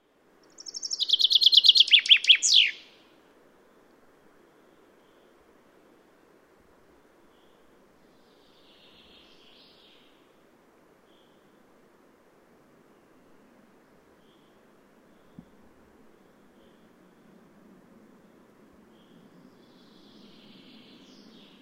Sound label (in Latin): Fringilla coelebs; SETE